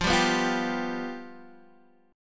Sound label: F Suspended 2nd Flat 5th, Guitar, Open D